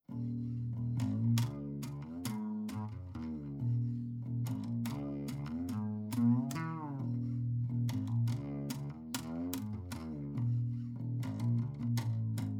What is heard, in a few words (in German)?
Lewitt LCT 1040 Cort Akustik Bass Acht mit Line6 Amp FET